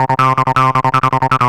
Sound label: Hardcore Loops Acid Loops, Dance Synths, 160; Best Service ProSamples vol.37